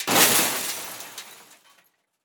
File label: Crash Car With Glass Debris; Crashes